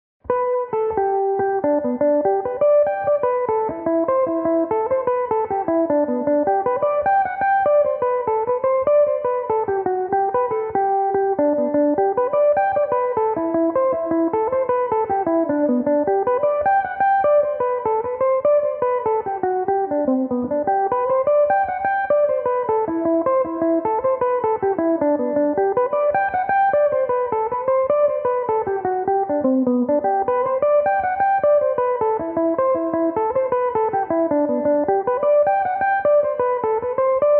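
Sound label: G major, mandolin; The Thrush In The Bush; Belmuse EM-190 electric mandolin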